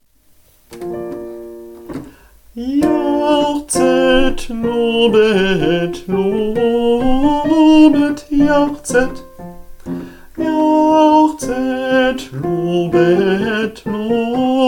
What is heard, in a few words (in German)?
Sopran; Jauchzet